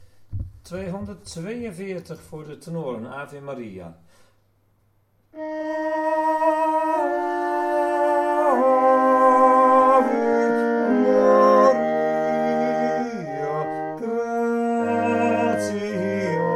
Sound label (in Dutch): tenor; koor; muziek-instuderen